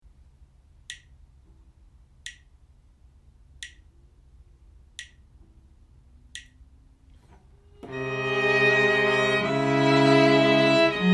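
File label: harmonic dictation, string quartet; eartraining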